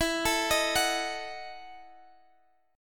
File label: E Major 7th Suspended 2nd Suspended 4th E, Guitar, Half Step Down